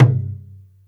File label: mid tom drum single hit c key